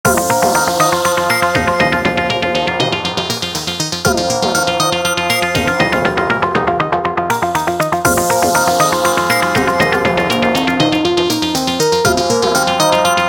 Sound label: space ping